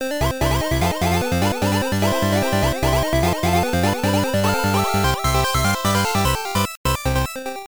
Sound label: Power Up; New Adventure Island; TurboGrafx-16